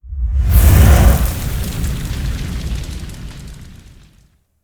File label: sound Dragon Boss Fire; freedom fall